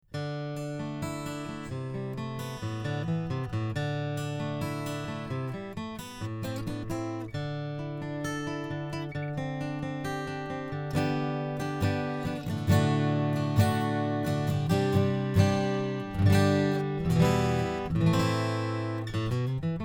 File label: Bone tusq pins, acoustic guitar